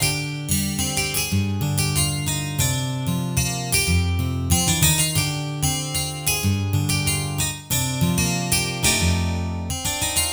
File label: 12 String Guitar; Sonic Foundry (Sony Creative Software); Mac Money; Guitars